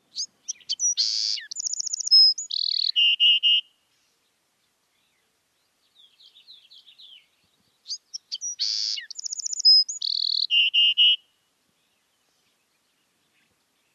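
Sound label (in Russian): крапивник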